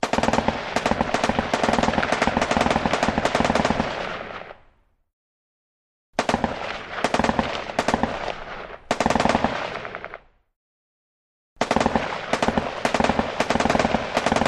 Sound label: M-60: Sporadic Firing, Bullet Impacts.